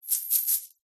coins many shake

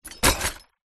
broken glass in cardboard box drop down on ground; foley

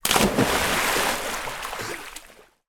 splash swimming pool small child jump

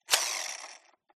toy car rc wheel grip flick spin manual hard; Skyclad Sound

